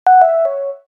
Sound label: Low Battery